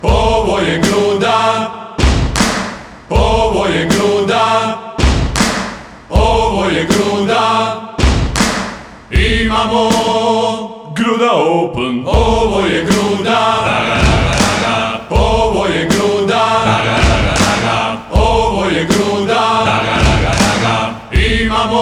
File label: ringtone; Gruda Open